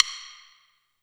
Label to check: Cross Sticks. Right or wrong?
Right